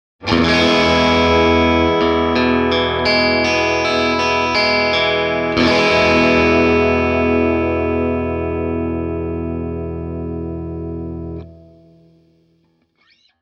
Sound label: tele, Swart AST